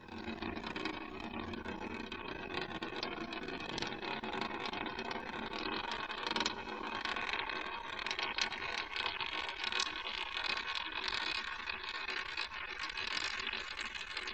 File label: Rolling Coin